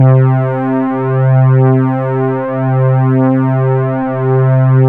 poly synth; Sound Gallery - Charged; POLY SYNTH 1

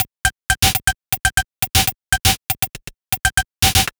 Odd Glitch, 120 BPM, Percussion

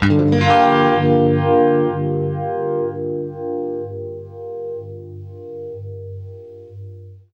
UNIVIB GM7, guitar; East West; Steve Stevens Guitar Samples Collection

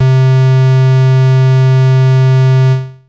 Techno leads, hits and riffs

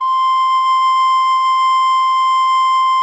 C6, garklein; SoundBytes - Early Patches

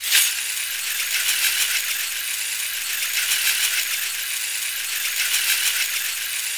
Chimes Loop, shakers

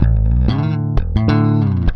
Groove Pluck, Bass; Construction Kit; Riffs, Patterns Licks; Bass X

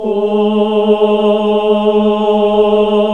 OOH G#, NUNS AAH OOH; Sound & Vision - Gigapack II

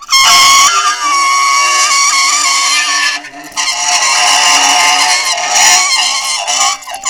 ronk, science fiction; E-MU Producer Series Vol. 3 – Hollywood Sound Effects

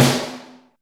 SNARE ROM; Back In Time Records - Big Bang

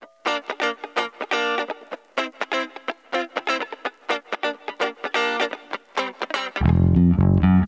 backing, Disco; Best Service ProSamples vol.48 - Disco Fever; AKAI

